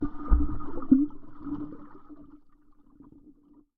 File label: underwater splash large; sonniss gdc